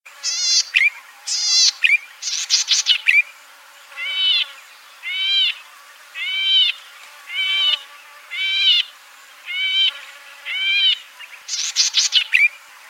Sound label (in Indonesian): suara cici merah call